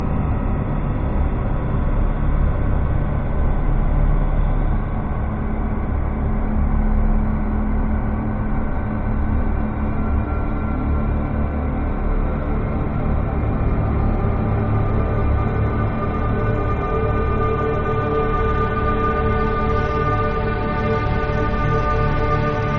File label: ambience; lara croft tomb raider anniversary ost; greece